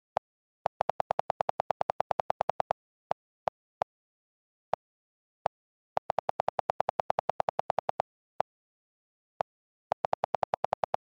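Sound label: iphone keyboard delete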